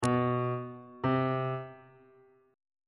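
c flat perfect 1st augmented 1st intervals on bass clef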